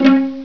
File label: Pizz Strings; If Monks had Macs; MacCube Volume 5: Home Applications